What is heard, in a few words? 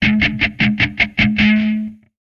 Music Muted E Guitar